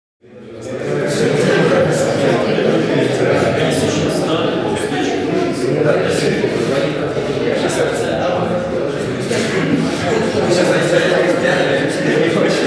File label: airport crowd opening close; Call of Duty Modern Warfare